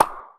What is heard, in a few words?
Toy Pop Gun Shot; Weapons